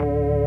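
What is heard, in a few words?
odd organ D3; Best Service; Advanced Media Trax Modern Composer; DANCE ORG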